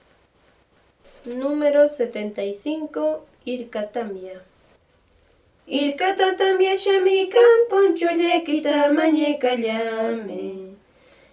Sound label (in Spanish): cancion